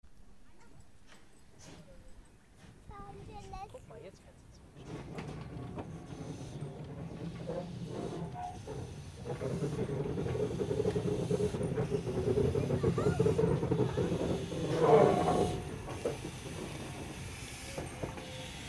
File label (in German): Dampf Lok Rangiert